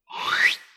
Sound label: swoosh swish; افکت صوتی